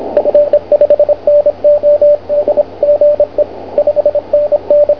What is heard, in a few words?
28 cw